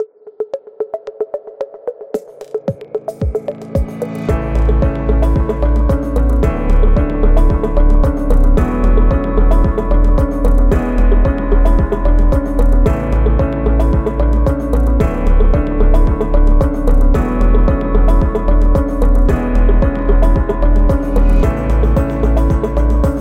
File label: Electro Beat